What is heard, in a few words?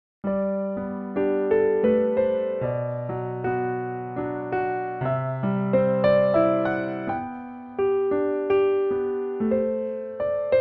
Minuet in G Major, Piano